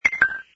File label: time beep